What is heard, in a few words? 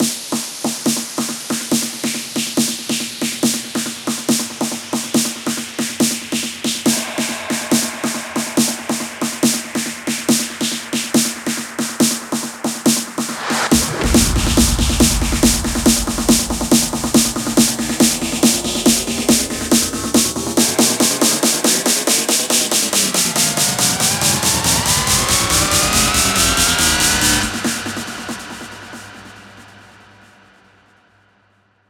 140BPM 16Bars, Hands Up Trance Fills; WTH; Vengeance Samples